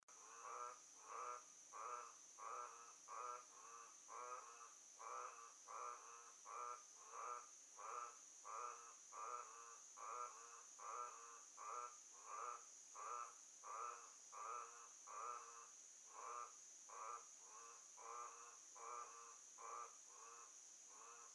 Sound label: Frogs Sound